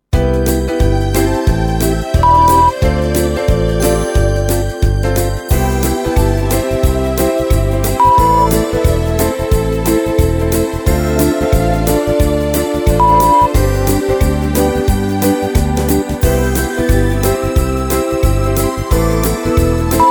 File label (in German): XMas Swing A Streicher; unbeschwert